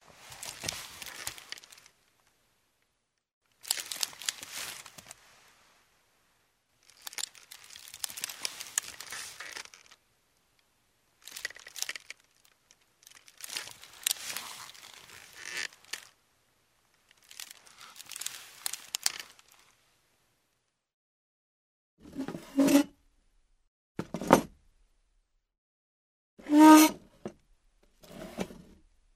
pletennij stul sadyatsya vstayut dvigayutsya

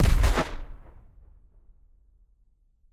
odinochnij predupreditelnij vistrel

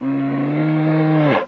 gromopodobnij zloj ryov medvedya

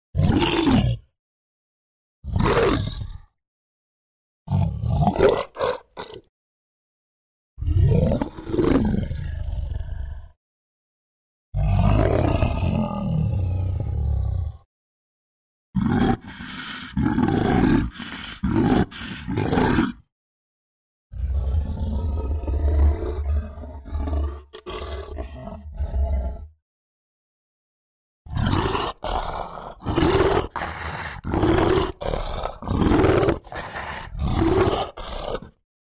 richanie medvedya

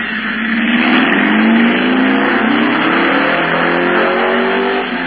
avtomobil bistro nabiraet skorost

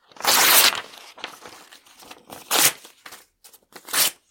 razriv bumagi

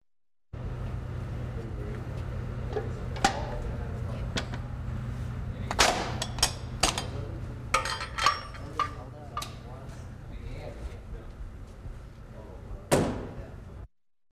proizvodstvo tipografiya press dlya gazet zagruzka bumagi

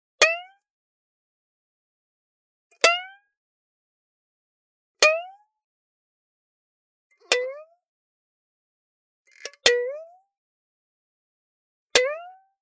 animirovannie zvukovie effekti iz visokoprochnogo plastika

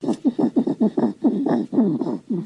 zvuk yozhika korotkij

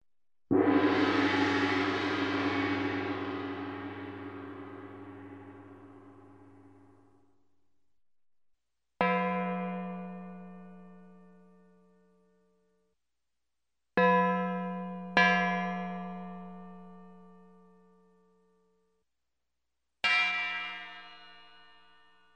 malenkij gong 5 variantov

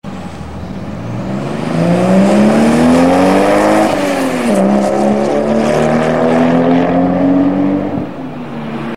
avtomobil toyota supra turbo

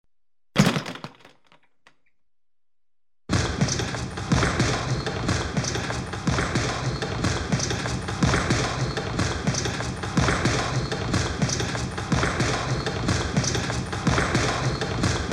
bokserskaya grusha odin udar zatem mnozhestvo

